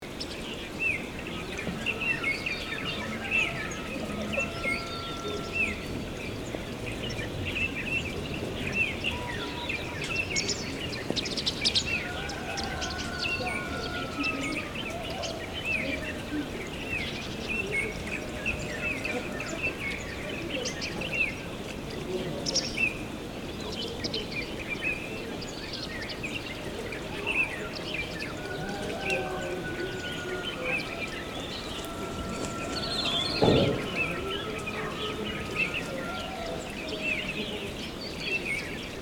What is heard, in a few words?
Desert village ambience light activity loop